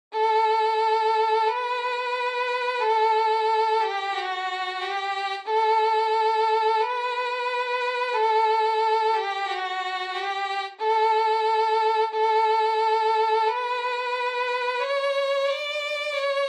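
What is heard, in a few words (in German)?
solo O du fröhliche Violine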